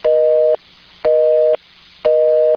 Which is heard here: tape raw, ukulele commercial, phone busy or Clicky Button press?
phone busy